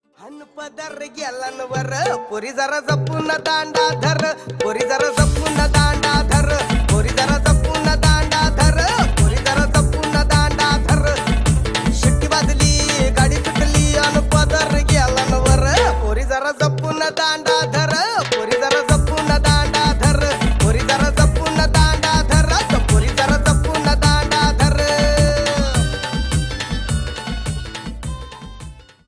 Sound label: Marathi Song